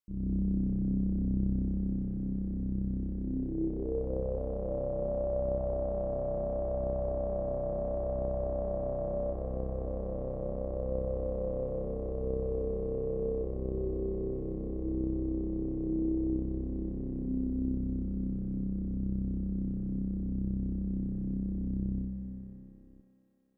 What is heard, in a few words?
Amb Drone Filtre